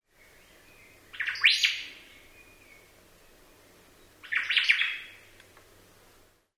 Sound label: hawaii omao